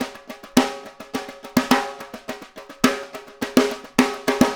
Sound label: Snare Pattern, Drums; Essential Percussion